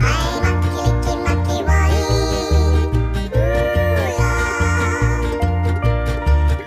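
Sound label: ocean world music suspense beach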